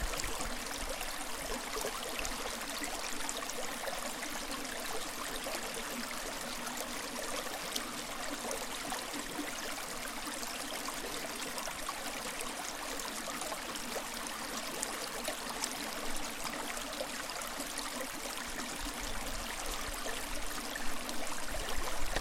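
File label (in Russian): небольшой ручей на холме Норвегия; totenosen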